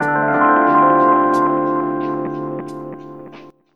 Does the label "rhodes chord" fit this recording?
Yes